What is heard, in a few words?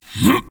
Grunt Jump; Androxus